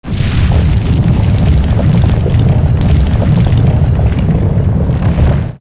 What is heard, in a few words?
stone move